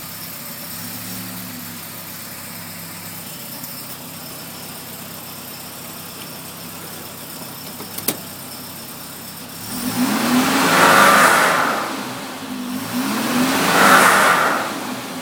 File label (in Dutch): ratelend geluid, volvo